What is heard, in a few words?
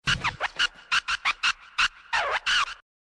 scratch